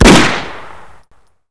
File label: beretta, weapons